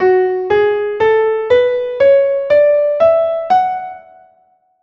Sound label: Lukan scale F# minor